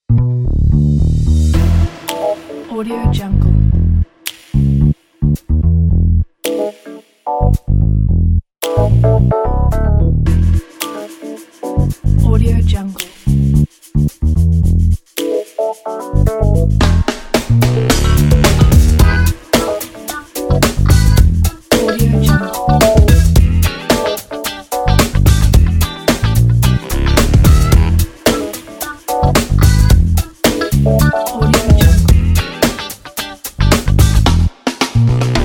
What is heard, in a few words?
funk groove pack